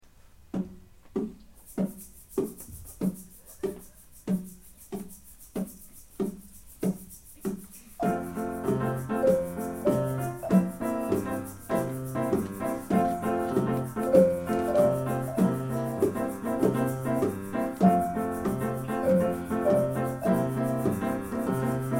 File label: samba lele